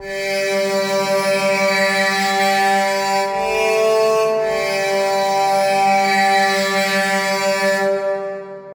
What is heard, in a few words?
Metal Bowed